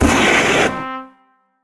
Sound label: trombone; rainblower; tf2